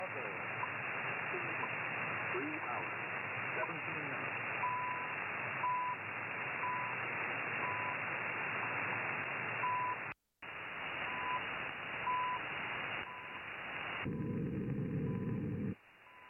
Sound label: RSP1B S3 3330khz; CHU